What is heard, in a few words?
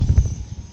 checkpoint; kena bridge of spirits